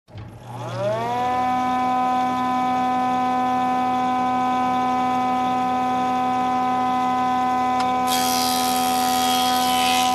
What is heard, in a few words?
Construction